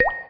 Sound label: raindrop